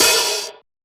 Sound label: Op Hat; A D KIT